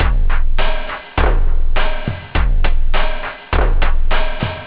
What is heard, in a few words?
shaker, techno; OctaMED SoundStudio V1